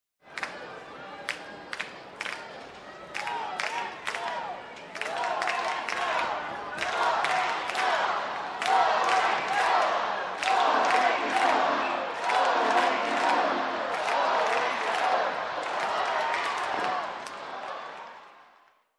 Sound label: Crowd cheering and clapping